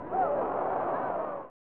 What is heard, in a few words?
Crowd; PGA Tour 96; SNES